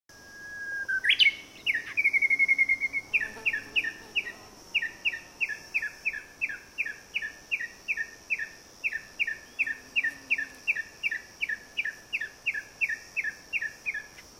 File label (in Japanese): uguisu; turugi